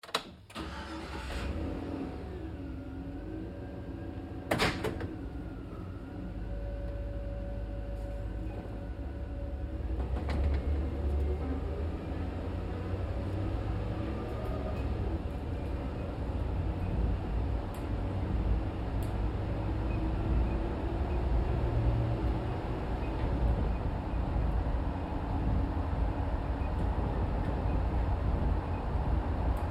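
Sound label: Truck GMC Savana Interior Drive Accel Decel Slow; Trucks; Big Room Sound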